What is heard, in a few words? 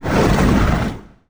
move step voice; Swampmon; SwampOfDarkness